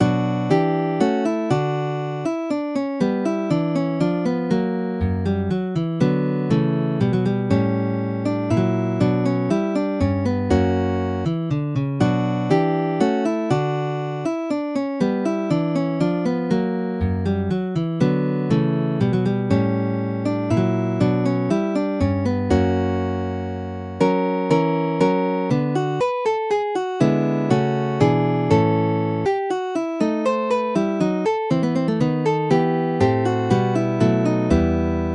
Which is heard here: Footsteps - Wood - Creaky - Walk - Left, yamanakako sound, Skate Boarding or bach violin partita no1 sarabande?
bach violin partita no1 sarabande